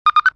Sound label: puff; nerf; rampage